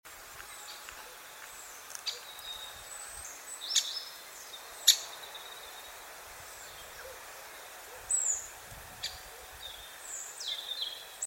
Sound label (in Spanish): Pico picapinos Dendrocopos major